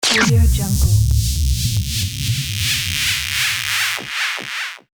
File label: squeezed zap; sound ideas